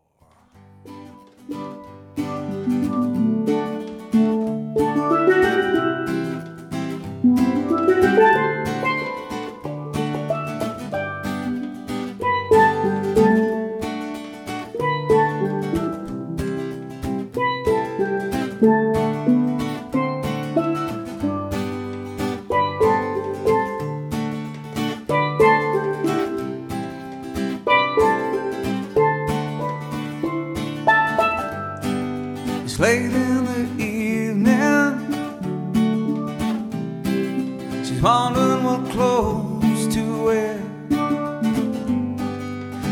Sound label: Steel Drummer; Tampa; caribbean